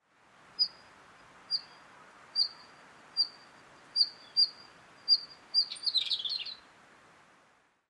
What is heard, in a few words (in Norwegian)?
hernar steinskvett